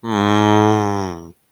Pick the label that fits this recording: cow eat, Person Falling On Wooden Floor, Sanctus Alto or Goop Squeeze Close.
cow eat